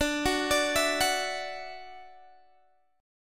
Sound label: D-Major Add 9th D down Guitar Open G